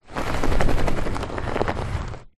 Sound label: tub storage large plastic drag carpet; foley